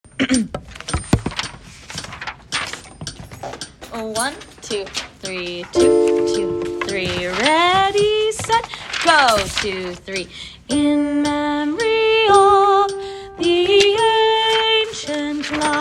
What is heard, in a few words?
alto